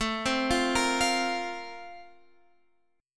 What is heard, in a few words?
Bb Major 7th Suspended 2nd